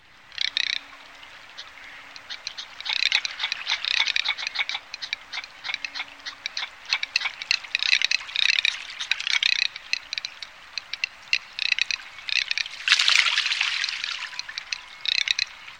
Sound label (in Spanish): cerceta carretona